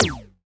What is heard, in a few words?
Alien FM Perc Short